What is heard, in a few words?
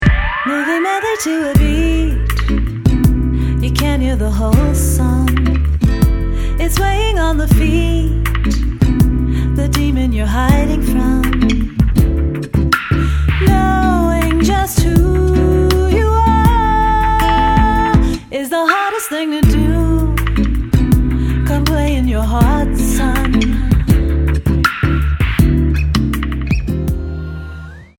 Pop Original tune